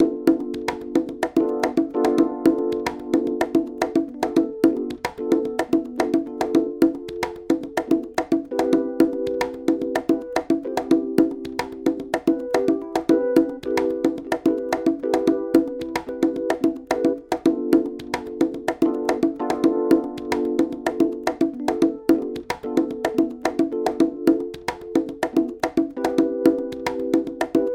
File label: Guitar Waves